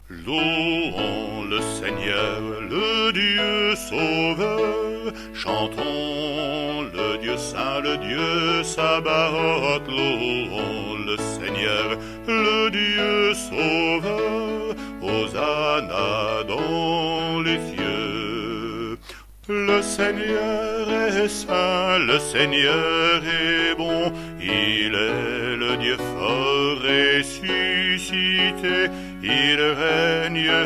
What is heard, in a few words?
Te Deum; Charpentier